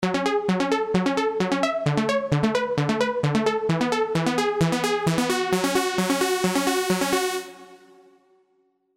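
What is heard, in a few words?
Lead Classic Trance; SERUM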